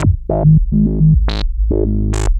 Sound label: G#1, VCF; CMP - Analogon; SH-VCF